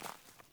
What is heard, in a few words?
Boot Walk Veg, Footsteps; Characters; Enemy; Melee Grunt; Boots; Sinecure